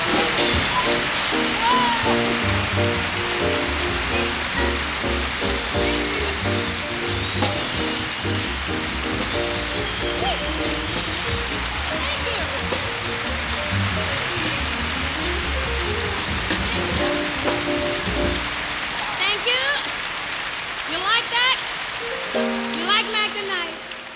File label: fanfare and announcement